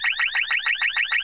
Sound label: klaxon loop